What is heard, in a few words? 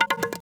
portal broom; sfx; riff Portal